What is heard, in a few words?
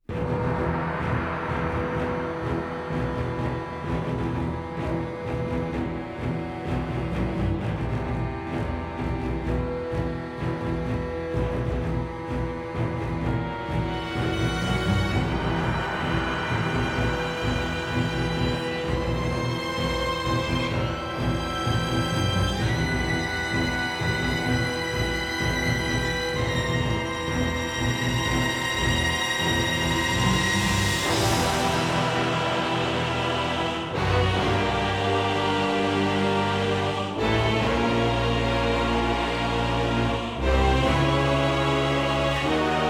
Earth-Shaking Power; dragon ball xenoverse 2